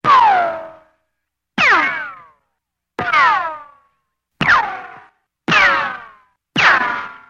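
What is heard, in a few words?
bullet sounds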